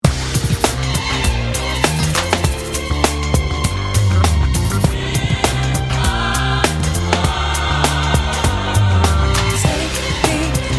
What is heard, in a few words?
pop groove